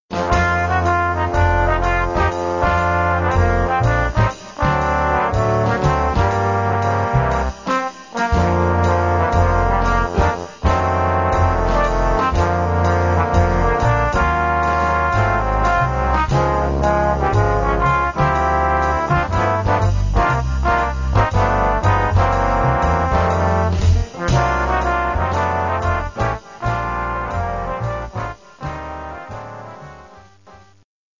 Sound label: easy come, trombones